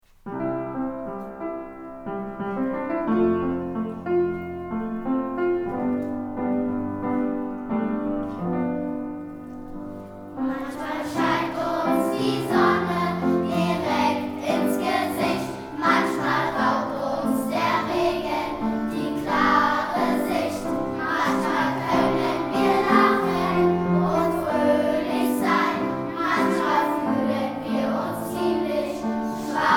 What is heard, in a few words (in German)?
Bechstein Lied